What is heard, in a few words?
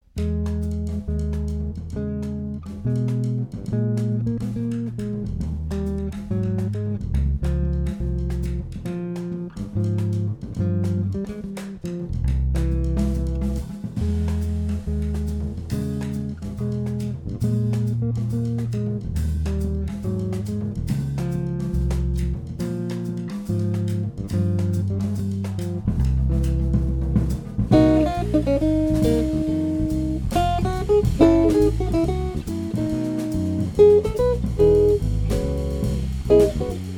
quartet, latin ensemble